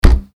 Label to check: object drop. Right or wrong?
Right